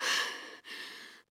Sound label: Pant; Lewd SFX